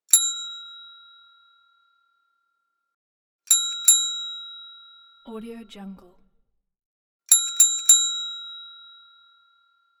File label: reception bell